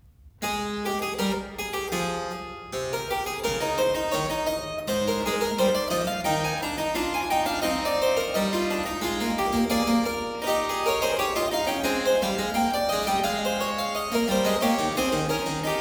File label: Dreistimmige Inventionen in A major, Keyboard Works; J.S. Bach; BWV 798; Johann Sebastian Bach; Inventionen & Sinfonias